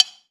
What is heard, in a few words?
Wood, Percs